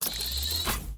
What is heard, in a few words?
mini uav